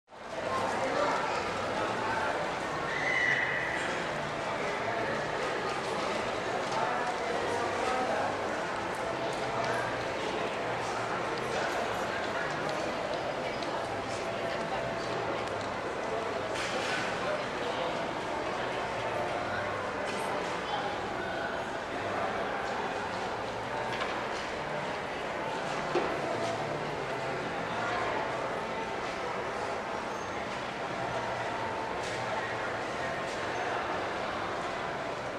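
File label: from the upper floor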